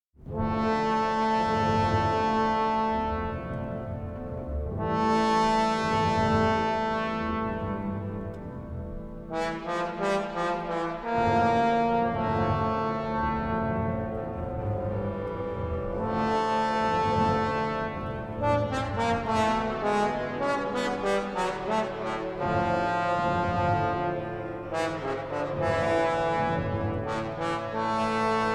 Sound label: Trombone; orkest